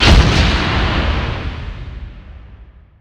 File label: Ice Shards cast